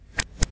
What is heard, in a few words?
close